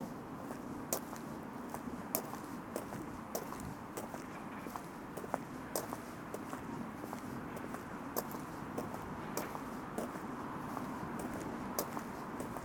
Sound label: Walking On Concrete Path